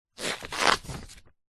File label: household pamphlet glossy thick page turn; zapsplat